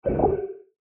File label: Odd Magic Effect; SoundsCrate